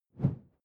whoosh simple short airy low

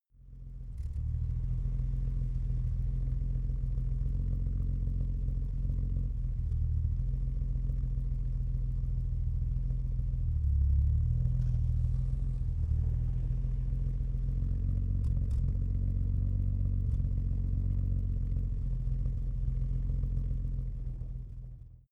car jaguar e-type idle exhaust

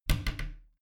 bathroom cabinet creak close regular; objekte; tueren

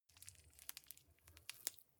gel dispenser press squish soft; haus garten; badezimmer

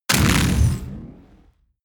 sci-fi crushing burst; schusswaffen

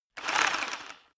rock drill hammer rev short; maschinen; bohrer; soundpool

